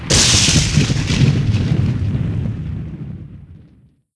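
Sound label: thunder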